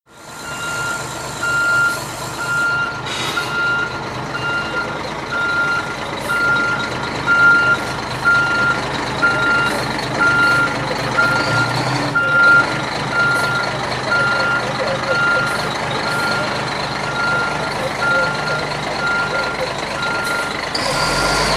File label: street sound